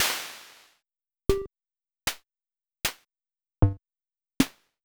Casio PT-30

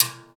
Timbale Side Stick, Roland BK-5; Percussion

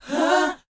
BOYZ C#, Vocal Planet, R&B Dance; Spectrasonics Vocal Planet

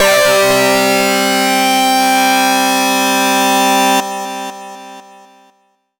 C2, Synth Hits; Zero-G; Soundclash Nu Dance Electro; Acidized

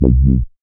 SYN MC WAH, Roland MC-202

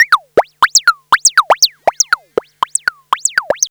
arp seq 120; AMG - Vince Clarke Lucky Bastard

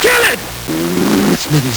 Nintendo Gameboy LSDJ

